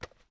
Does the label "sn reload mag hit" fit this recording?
Yes